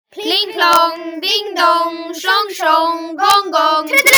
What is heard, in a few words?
pling plong